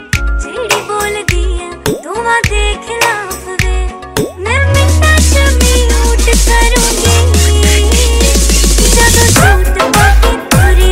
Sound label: punjabi song remix ringtone